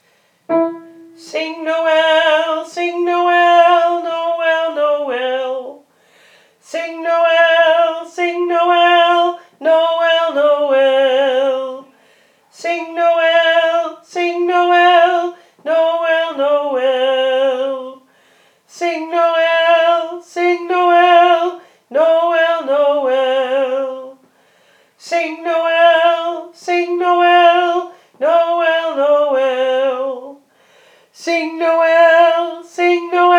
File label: sing noel alt, vrouwenkoor